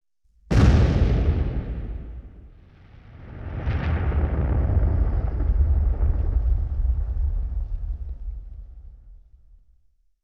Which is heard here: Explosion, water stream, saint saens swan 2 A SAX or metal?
Explosion